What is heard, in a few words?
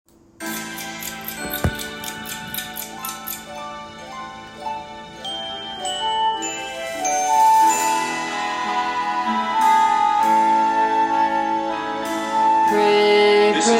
Tenor Opening “Pray”; Fame The Musical - Holiday Pack